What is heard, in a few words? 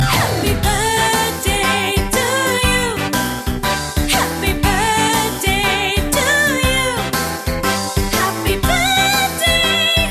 happy birthday to you